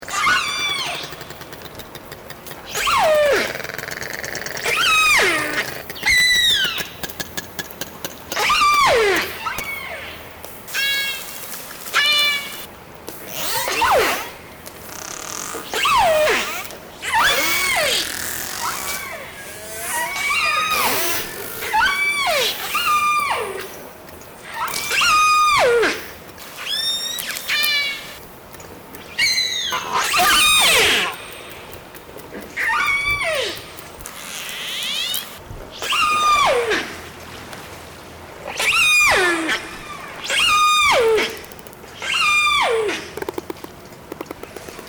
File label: Orca chatter